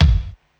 Bassdrum; Polyend; Legowelt

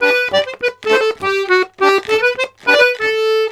Accordions, BOUREE; USB Soundscan vol.40; AKAI

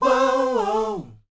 SHOO B D, R&B Dance, vocal; Spectrasonics Vocal Planet

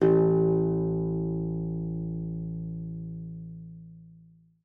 A1, GUITAR; Xsample VOL-2

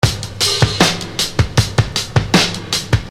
Beat, Hip-Hop Street Beats

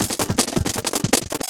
Beat, Industrial

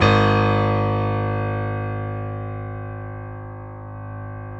PIA GRDPI, PIA_GRAND, Roland

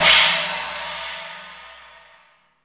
DOPPLER; Back In Time Records; Big Bang; THE ROCK

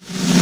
Roland JV-2080 REV SN Roll

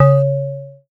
C#, BELL GONG, Synclav FM; Big Fish Audio

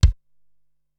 Thump Kick, Ensoniq SQ-R Plus

